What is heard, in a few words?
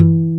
E3, ACSTC.G; AKAI S-Series CD-ROM Sound Library VOL-2